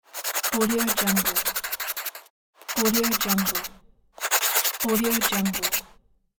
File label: writing